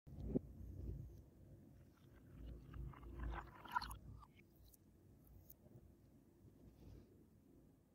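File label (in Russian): наливание стакана молока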